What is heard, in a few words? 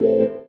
stabs hits